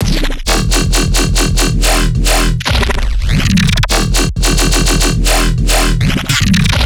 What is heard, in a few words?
Riddim Bass Loop D# Toxic (140); Bass Loops; Ghosthack; Riddim Essentials 2020